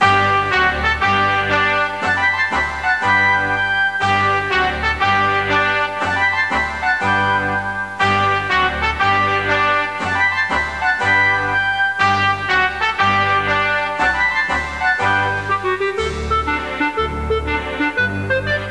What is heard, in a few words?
national anthem stereo